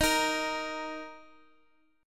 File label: D sharp 5th; strummed; D#5; x,x,x,x,4,6; JGuitar